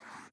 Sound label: Ink Sound Stroke; CoreAudio; SystemSounds